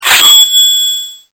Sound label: sword